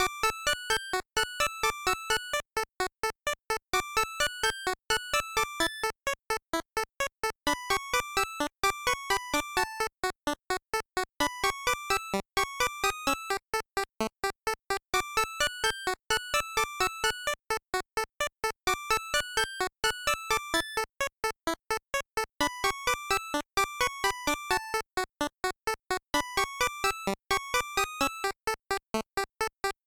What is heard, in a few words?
Lullaby (Without Intro); Infernax; PS4; Xbox Series X/S